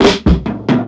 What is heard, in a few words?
Tom Roll n Break; Drum Digger